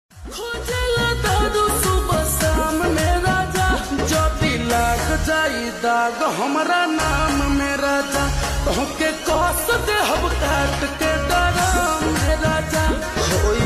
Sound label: Slowed Reverb Ringtone